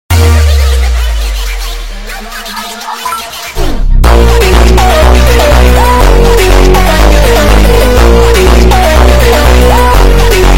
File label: Phonk